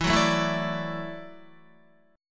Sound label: E 7th Suspended 2nd Suspended 4th, down, Guitar; E7sus2sus4 strummed; JGuitar